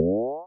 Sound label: jump